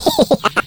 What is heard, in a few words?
skelly medium; Garry's Mod; tf2; halloween; skeletons